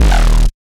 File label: D kindalectro, bass